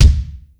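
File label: bass drum rock; 300 Drum Machines; Yamaha Motif ES-Series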